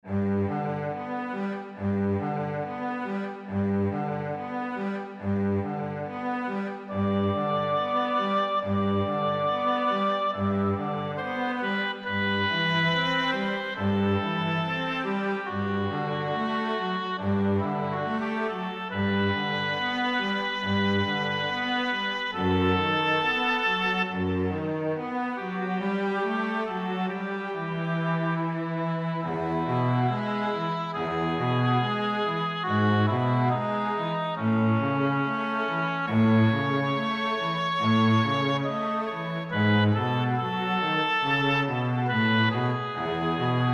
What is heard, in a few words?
oboe